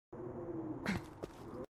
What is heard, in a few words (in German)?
springen; borderlands 2